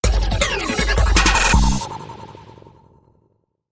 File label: Dark Electro Sting